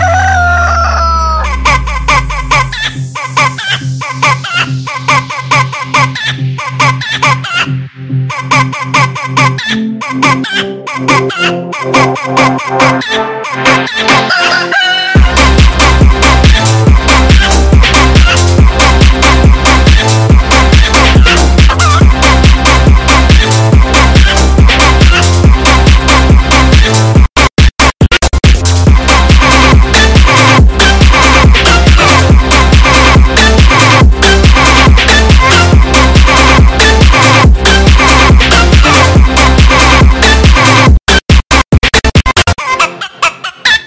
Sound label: phonk